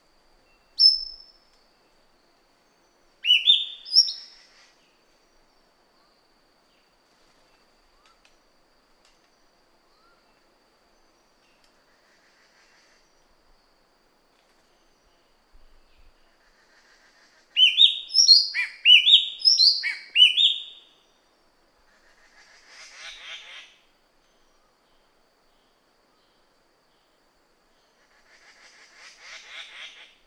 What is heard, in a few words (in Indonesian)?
suara burung cissa thalassina ekek keling